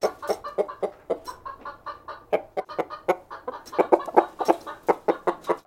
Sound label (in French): poules